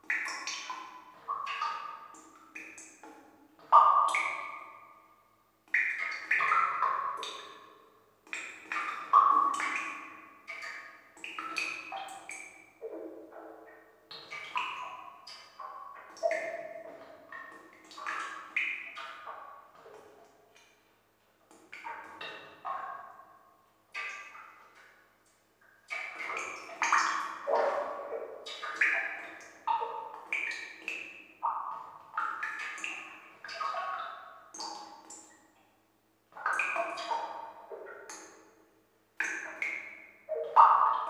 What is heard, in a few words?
ambience water